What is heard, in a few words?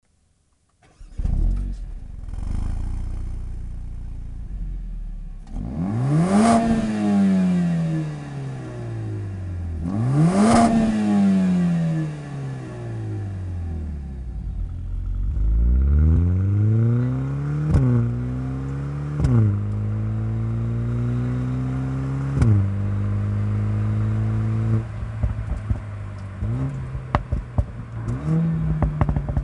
STOCK EXHAUST MERCEDES AMG A45 W177; OPF GPF 2022; evolution link pipe set